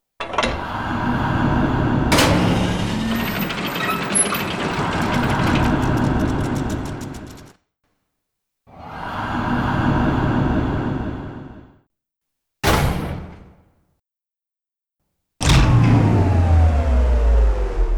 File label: SultanMachine; Lucius III